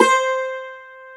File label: Cumbus Saz C4; Multisamples; Planet Earth; Digital Sound Factory Planet Earth